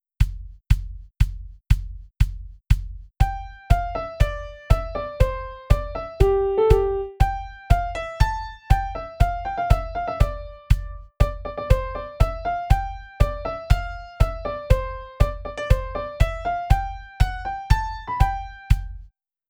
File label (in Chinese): 頒獎樂（快版）